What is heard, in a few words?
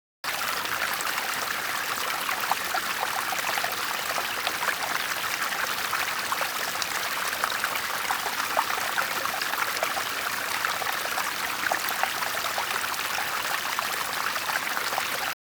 water stream small; best service Blue Box